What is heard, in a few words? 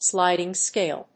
sliding scale